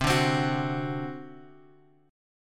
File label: C Suspended 2nd Flat 5th C; Csus2b5 Chord {X 3 0 X 1 2} strummed